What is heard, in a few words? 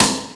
RIM; Best Service; Hyper Dance; CONSTRUCT